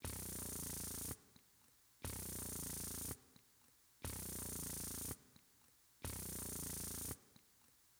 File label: hi hat roll